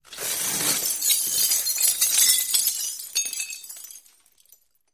Glass Falls Medium; windows & mirrors

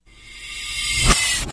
Mechanical Door, pneumatic

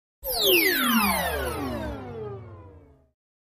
Multipitched Synth, laser

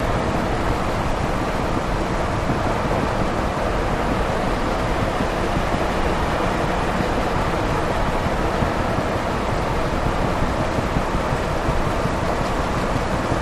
Highway Traffic, Light; Close Perspective Cars

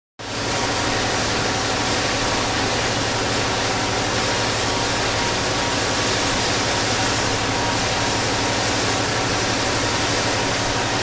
Idle Diesel Train

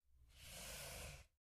Short Mouse Slides

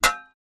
Metal Hit Ring, small impacts; Metal Hit and Ring

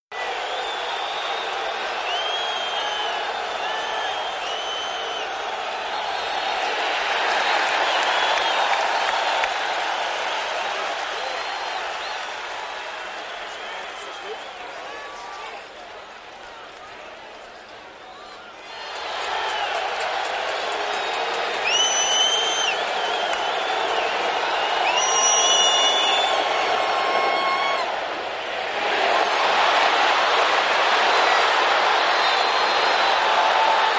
Football Crowd Rowd, sports arena football

